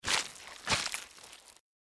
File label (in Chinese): 拖地